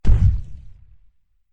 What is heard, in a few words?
step; backwards; demon